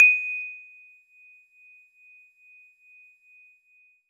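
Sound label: Warble Celeste DX100 D#0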